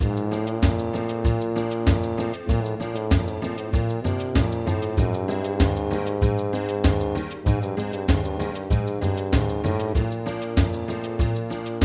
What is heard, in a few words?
music shop; Ty The Tasmanian Tiger 2 Bush Rescue; XBOX